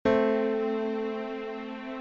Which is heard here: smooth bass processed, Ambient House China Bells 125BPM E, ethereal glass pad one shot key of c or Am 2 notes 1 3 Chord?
Am 2 notes 1 3 Chord